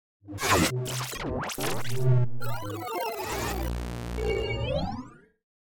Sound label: Glitch Digital Left To Right; 5.1 STEREO MIX; Blastwave